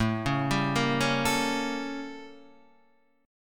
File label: A 7th Flat 9th Guitar Open D; 7,4,5,4,4,7